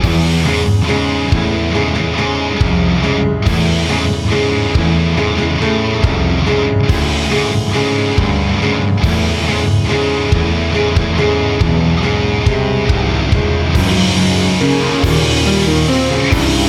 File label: Fury Anger Confusion Chaos Heavy Piano Metal; CUES for film TV games etc